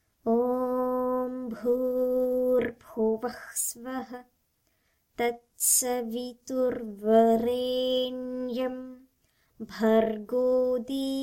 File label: Gayatri, mantras